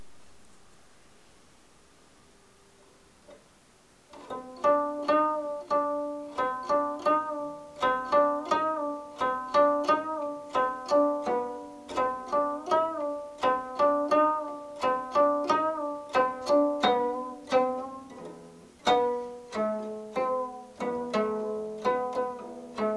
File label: Koto sample; Basteln